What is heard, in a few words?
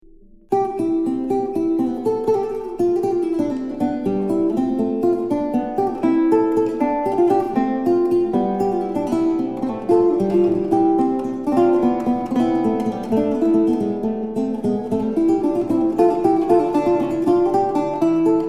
Guitar Sonata In C Gigua; Roncalli; Guitar Sonata 8